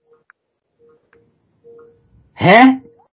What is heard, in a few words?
huhhh